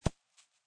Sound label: Sports Golf Ball Land On Grass And Roll; Golf Sound Effects; Golf (Sound Effects:Sports)